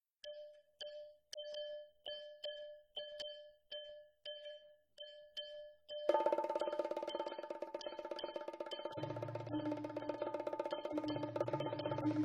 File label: Drum Talk; Endangered Species